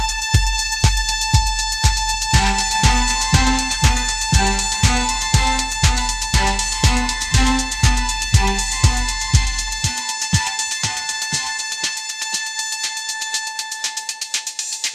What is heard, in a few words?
upbeat pop melody